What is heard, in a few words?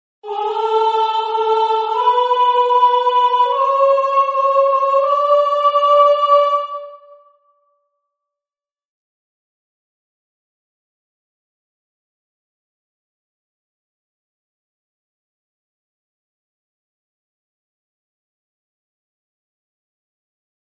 alto